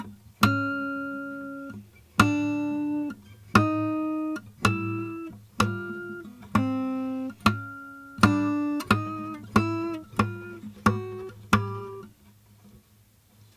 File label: Pinch harmonics